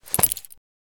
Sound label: grenade throw